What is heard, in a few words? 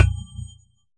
impact Mining